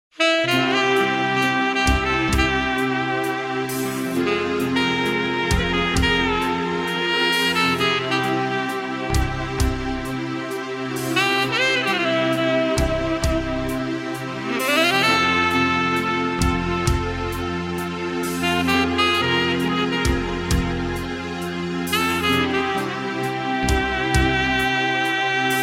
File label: instrumental; City Hunter - The Secret Service